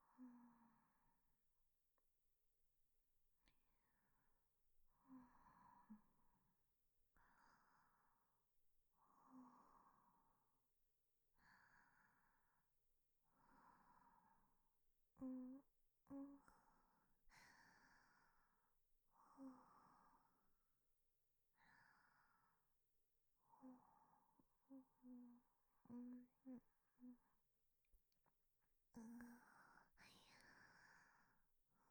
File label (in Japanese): ループ右 寝息, 彼女の寝息